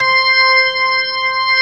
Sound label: ORG, Organs